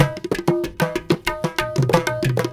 INDIA R, Heart of Asia, IND ENS

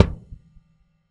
Slow Decay, Kicks; Airwindows

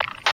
RADIO ZAP; Total Control - Action Cutz; COMMUNICA

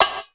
MONDO PERC, METALIC DRM

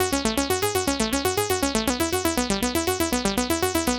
SH101 Arp (120BPM), retro house

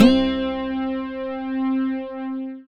SYN, Roland JD 800

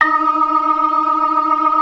ORGAN, 4 DRAWBARS; Keith Emerson Signature Series

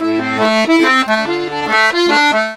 Accordions; USB Soundscan vol.40; AKAI

